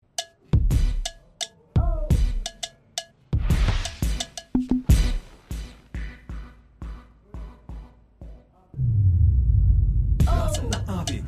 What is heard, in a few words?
Boxing Rap